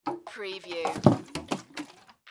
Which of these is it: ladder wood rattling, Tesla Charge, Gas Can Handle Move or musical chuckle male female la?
Gas Can Handle Move